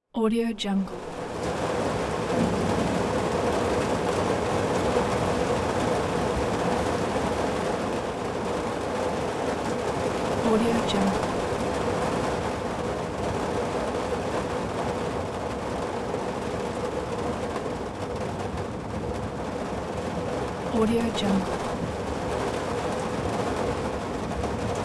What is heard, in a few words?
rain on roof